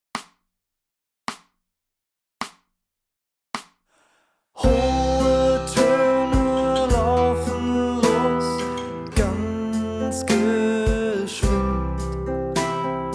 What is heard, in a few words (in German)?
Hohe Toene laufen los; die bunte gitarrenschule band 2